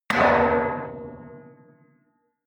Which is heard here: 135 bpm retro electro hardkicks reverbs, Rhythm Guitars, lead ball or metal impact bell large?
metal impact bell large